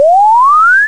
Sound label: Row; CD Action 51; cubemaster